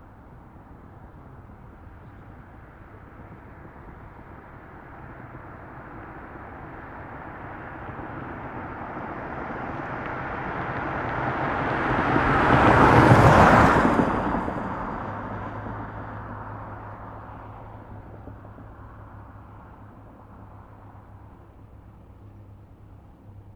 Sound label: Generic Car, Pass By, Fast, Desert, Ext; Poetry Project